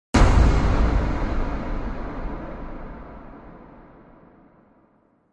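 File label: Impact Metallic Clang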